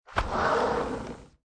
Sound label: Door Open Sliding Glass, Household, Door Open, Sliding Glass Door